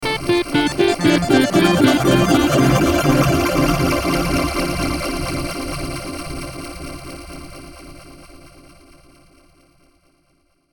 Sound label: Logo Digital Beeps Shimmer; Blastwave